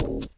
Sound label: glass, footsteps walk